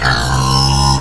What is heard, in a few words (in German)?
Schwein